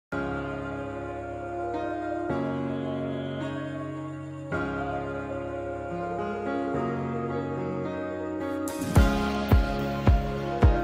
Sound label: Instrumental